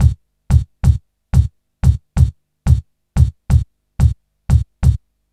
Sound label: Kick Loop, Drum & Bass